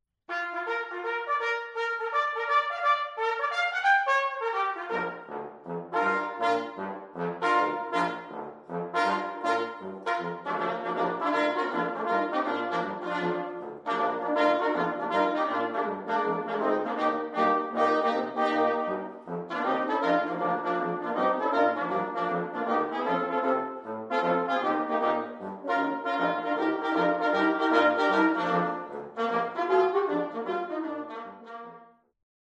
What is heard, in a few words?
brass ensemble